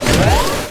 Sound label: Menu Scifi